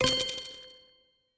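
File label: user interface buy; slots; sRoma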